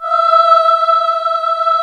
VOX E4; AKAI S-Series CD-ROM Sound Library VOL-2; VOICE

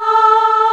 VOX A3; AKAI S-Series CD-ROM Sound Library VOL-2; VOICE

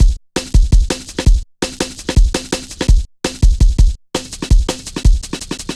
Rollin Shaker, Drum Loops 166.5; Zero-G Jungle Warfare 1, 2 & 3

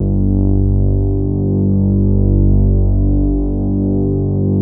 MOOG BASS G2; Best Service Dream Experience

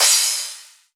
Crash, Cymbals

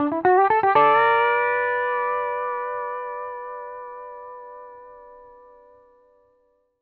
PD LOOPS 120, Whole Lotta Country

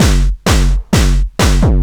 drum 130 techno; Techno Trance Essentials

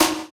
Snare, jungle breaks drum sounds

